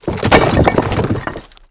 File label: objects, World of Tanks